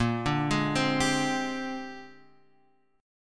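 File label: A sharp 13th chord, Guitar, Drop D tuning; 8,5,6,5,8,x; down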